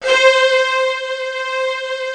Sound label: ST STR C5, SYNTHS, House Side Of 2 Step; USB Soundscan vol.51; AKAI